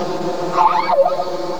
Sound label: simulated Call